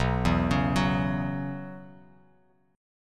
B Suspended 2nd Suspended 4th, Bass Standard; arpeggiated; JGuitar

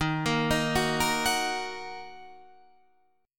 D sharp Minor, Guitar, Half Step Down

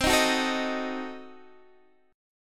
C Diminished 7th down Guitar Open D

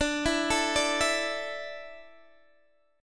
D Suspended 2nd D, down, Guitar Open D

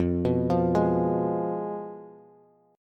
F Diminished F down Bass; Fdim arpeggiated; JGuitar

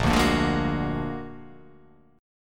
A sharp Augmented 7th A sharp, guitar

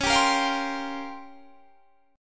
A sharp Minor 6th Add 9th C sharp, Guitar chord

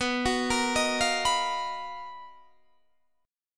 B Major Sharp 11th, Guitar, DADGAD

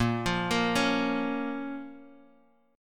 A sharp Minor A sharp down Guitar Open D

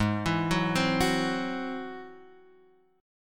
Ab Augmented 7th Ab down; Ab+7 arpeggiated; JGuitar

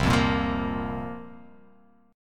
C 7th Suspended 4th, guitar

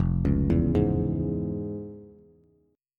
F Minor Major 7th Sharp 5th, Bass; FmM7#5 arpeggiated; 1,4,2,1

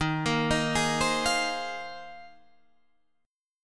Bb 7th Suspended 2nd Suspended 4th Eb

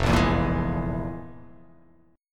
F Minor Major 7th Double Flat 5th, chord

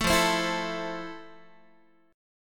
F Major 7th F, Guitar; strummed

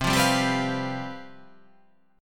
C Major 7th Suspended 4th

